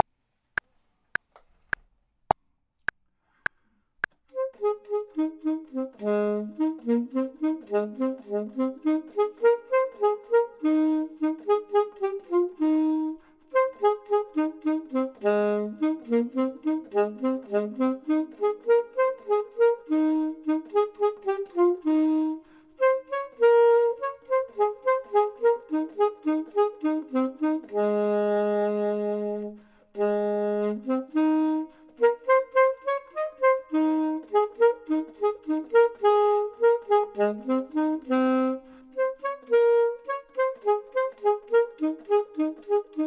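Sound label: bottom, Saxophone and Clarinet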